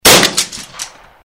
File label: Shot Gun; Guns